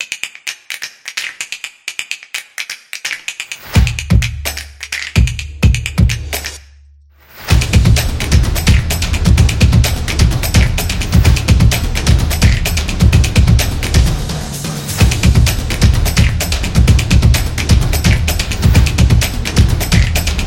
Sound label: Percussion Trailer Intro, stomps and claps